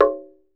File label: TUNG HI, African Perc; E-MU Producer Series Vol. 7 – Old World Instruments